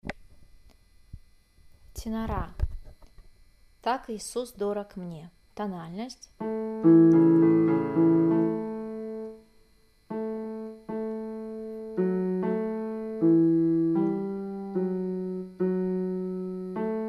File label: tenor; Tak Iisus dorog mne